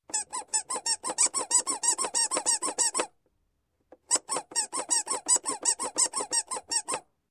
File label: Toy Squeeze Toy, Squeaking; Sound Ideas 2000 Series